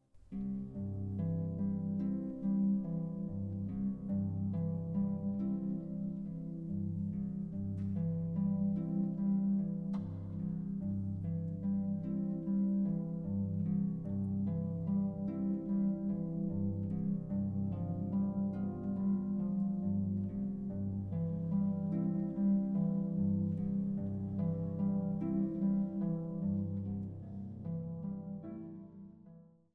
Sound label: piano